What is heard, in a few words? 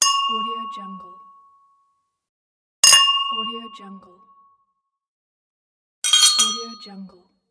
glasses